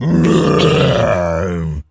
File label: zombie voice grunt